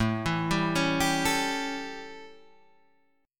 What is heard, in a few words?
A 7th, chord; arpeggiated